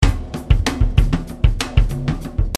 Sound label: Eight Patterns